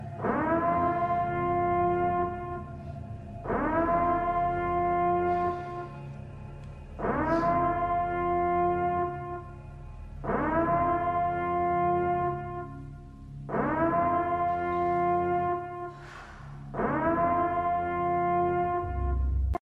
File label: siren; purge